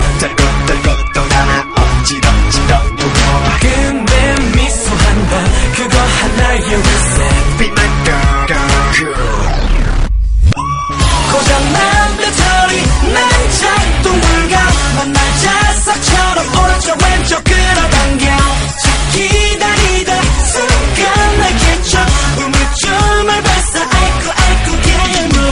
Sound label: Lalala